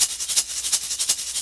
D&B Shakerloop; Dance DJ; Percussion; Shakers

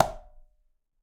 Conga C Slap Mute, Latin Percussion; Drums; Drum Hits; Ableton; Factory Packs

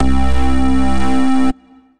bass lead; Synth Lead; Say It Bass Lead; Ableton; Factory Packs; Beat Tools

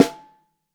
Top, Snare

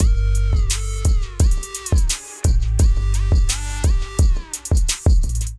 Psycho Kit groove 86 bpm; MIDI Clips; Ableton; Factory Packs; Beat Tools

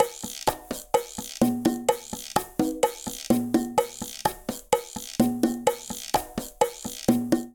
Cha-cha-cha-127bpm, Latin Percussion; Ableton; Factory Packs

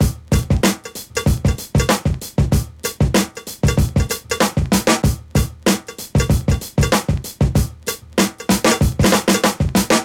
Drums, breakbeats; Ableton; Breakbeats by KutMasta Kurt

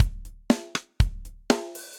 Kit, Standard Kits, Drums; CopStyle; Ableton; Session Drums Studio

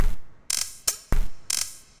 IDM Perc, IDM Percussion

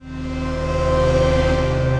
Strings, ominous pads; AI Strings; Ableton; Mood Reel

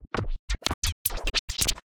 More Glitchy Drums; Inspired by Nature by Dillon Bastan; Vector Grain Presets

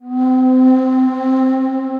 Mystic Whistle, Tranquil Winds; Ableton; Mood Reel

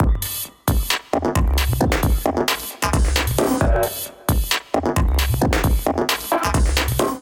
Tada Kit groove 133 bpm; MIDI Clips; 133 - 151 bpm; Ableton; Glitch and Wash

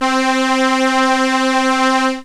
Solina Viola C3; Analog Synths; Ableton Foundation FreePacks

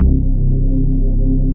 Wooley Bass; Synth

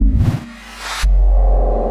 Lofi Beat; Ableton; Factory Packs; Inspired by Nature by Dillon Bastan; Emit Presets

